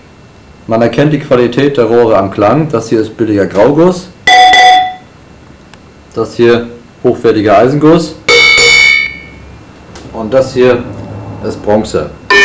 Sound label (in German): klangprobe, Waffen